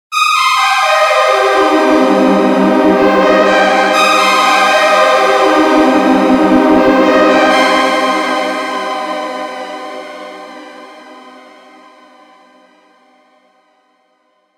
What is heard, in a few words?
wobbly strings